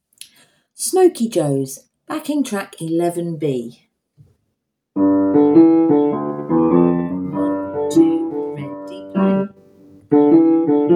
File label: backing track; keyboard and piano resources